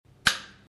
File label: switch